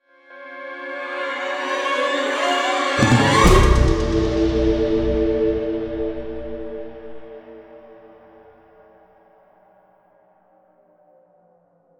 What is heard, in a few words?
Riser Stinger Big; mcsm music; General Stings